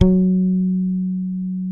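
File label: Sadowsky 5 MUTE F#3, Bass; Sampleheads; Will Lee Bass Library; SADWSKY5MUTE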